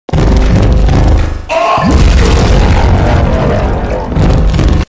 laser piss

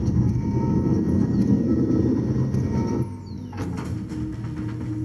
EMP; Resurgence

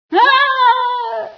scream, female sci

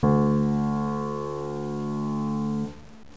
piano d

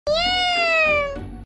nyaa

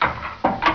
valve pickup

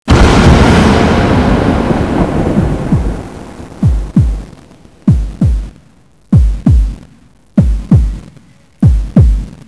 heartbeat, ambience; unholy

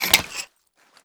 load, rpg7

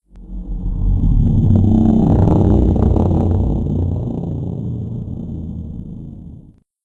breathe, cthulhu; sven; npcs

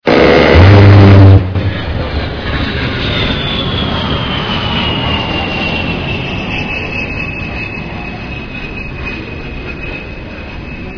gatling, ambience

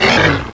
gonome pain

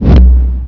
alien wave

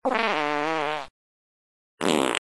flatus

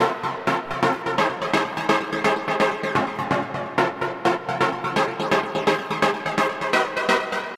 stabs, music

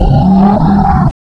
move attack vocals

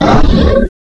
small flinch

